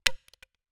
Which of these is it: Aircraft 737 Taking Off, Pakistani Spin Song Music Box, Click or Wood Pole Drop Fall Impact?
Wood Pole Drop Fall Impact